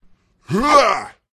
human male attack voice up

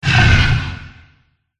dragon boss wound

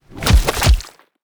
monster baf

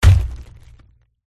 chaos titan attack contact up

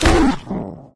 Mantikora Corupt Wound

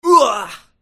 mage male wound

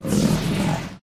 Tiger Block

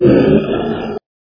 bear death

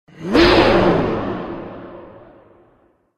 boss attack voice middle; eldive

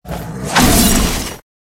Tiger

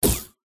jelly fish attack contact bottom

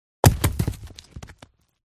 goat attack voice bottom

